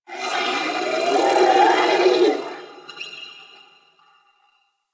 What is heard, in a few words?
Sentient Symbilyst Idle; Enemies; Barks